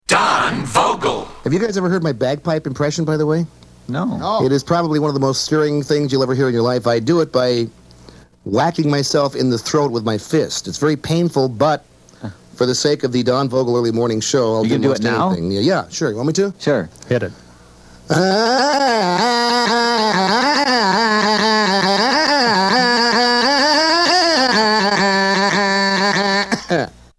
Bagpipe; KSTP AM

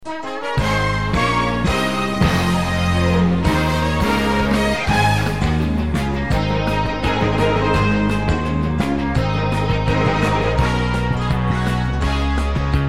Promo Music Instrumental; WCCO AM

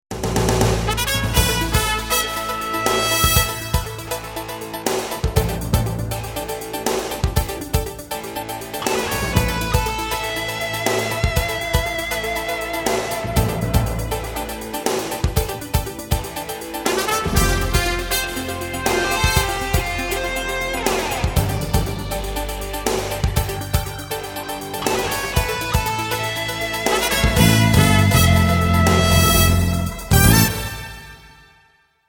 Jingle Instrumental; WMNN AM

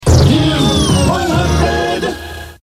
Jingle; WYOO

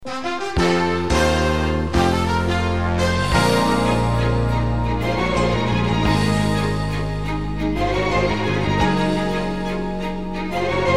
Weather Jingle Sounder; WCCO AM

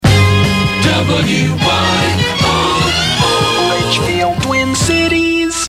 Jingle; WYOO AM

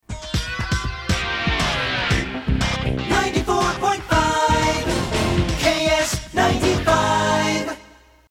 Jingle; KSTP FM

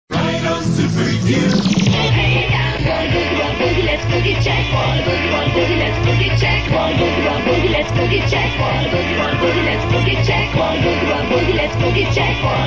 Jingle; WYOO; Boogie Check

